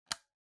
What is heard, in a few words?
Clock Alarm (Toggle)